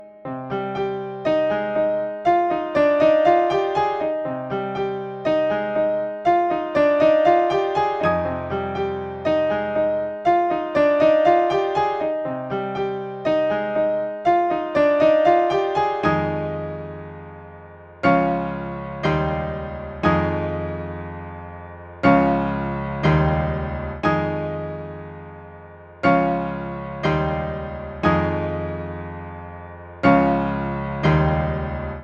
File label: Piano all